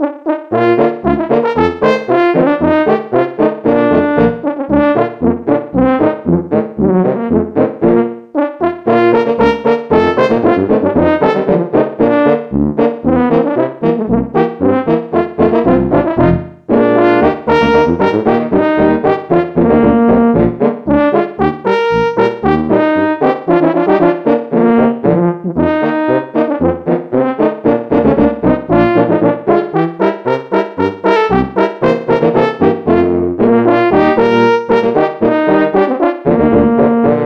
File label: level Up; Boot Hill Bounties